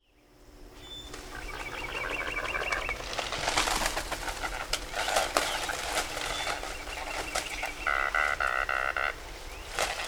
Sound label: Anhinga